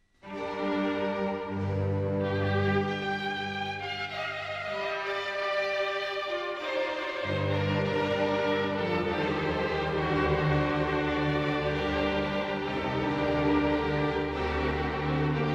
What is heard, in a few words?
Excerpts Sung in German; Messiah, HWV 56; No. 22, Seht an das Gotteslamm